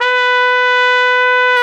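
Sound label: Trumpet mf, Solo Brass; Roland L-CD702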